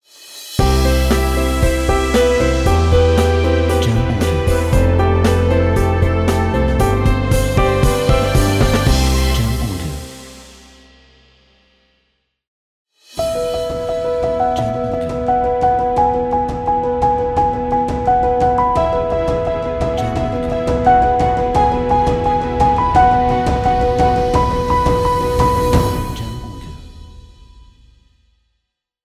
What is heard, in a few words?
Uplifting Corporate Logo Pack